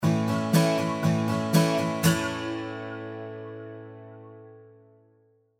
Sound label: Flanger; PCM81